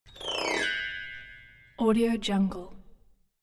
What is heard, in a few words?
high piano strings descending gliss accent